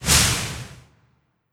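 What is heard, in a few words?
Hydra poison; Hydraposion2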